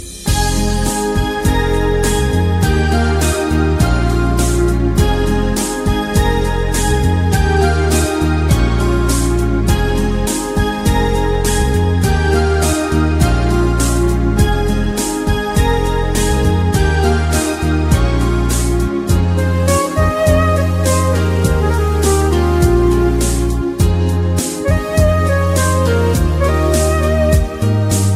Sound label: ringtone